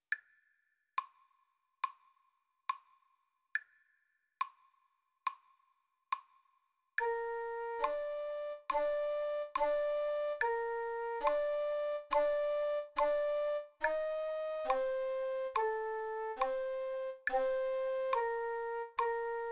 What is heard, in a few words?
russian folk